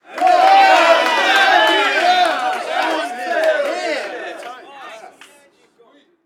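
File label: Street Ball Crowd Cheer High; Crowds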